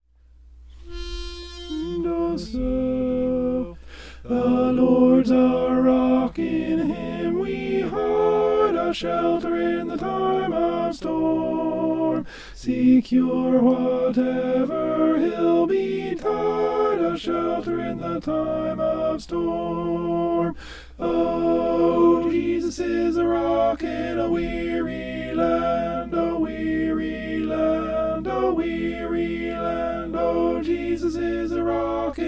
alto

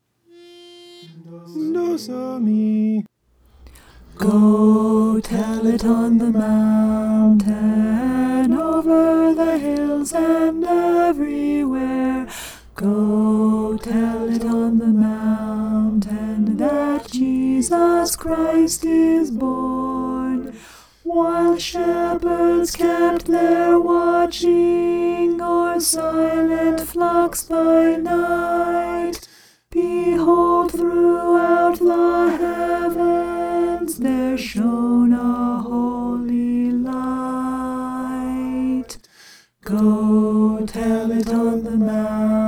go tell it alto

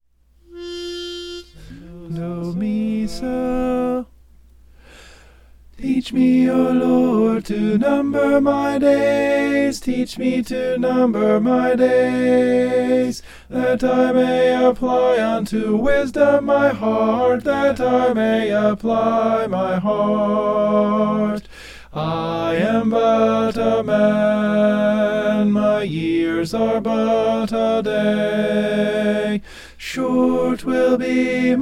teach me o lord tenor

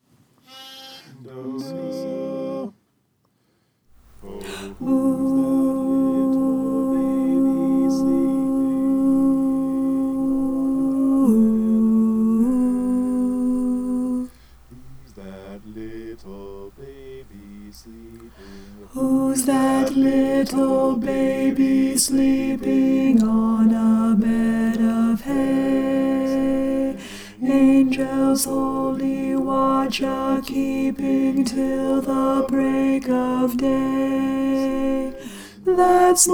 alto

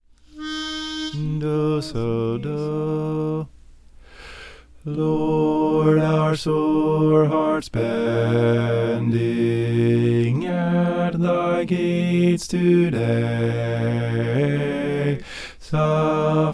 bass

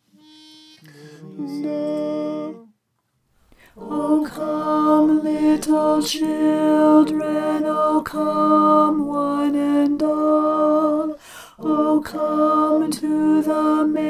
alto

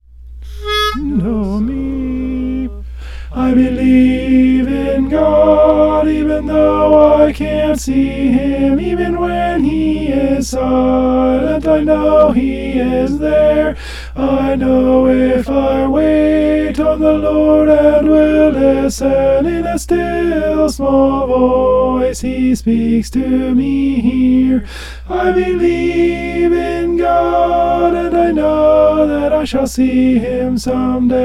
alto

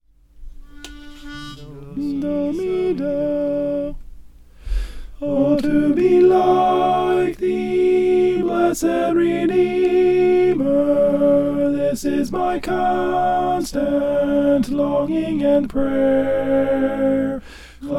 alto

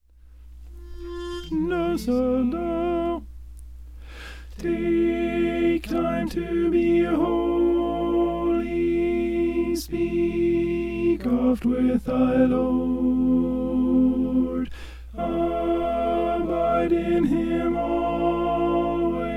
alto

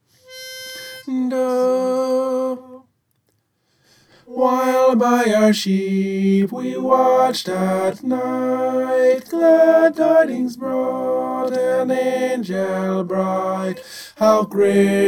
tenor

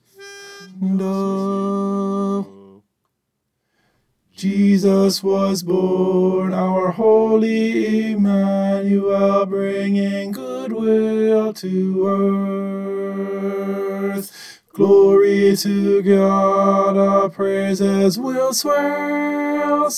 tenor

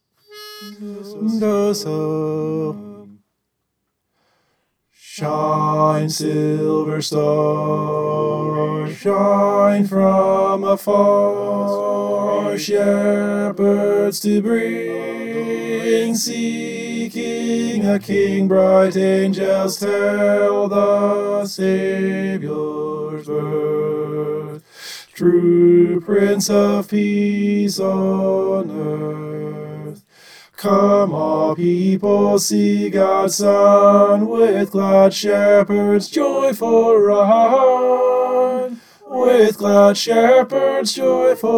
tenor

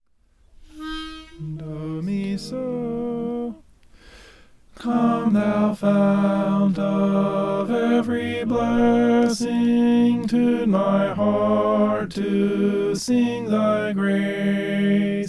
tenor; come thou fount

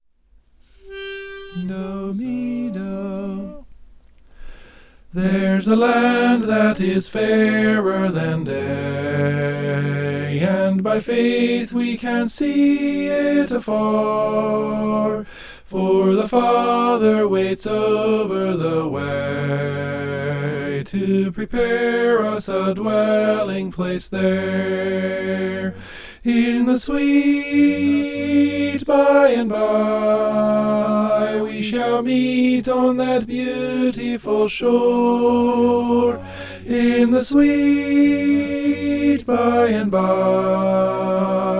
sweet by and by soprano

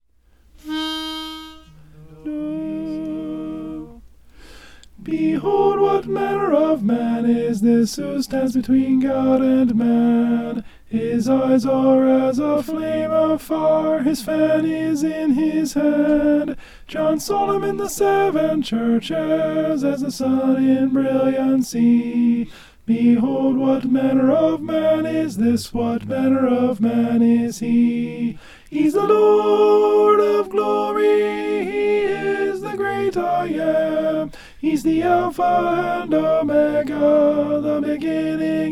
alto; behold what manner

